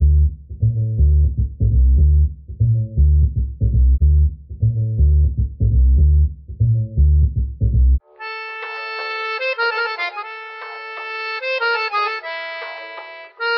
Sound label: keys